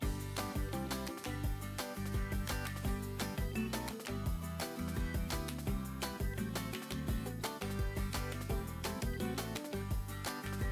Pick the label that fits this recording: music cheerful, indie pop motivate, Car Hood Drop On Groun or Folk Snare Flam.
music cheerful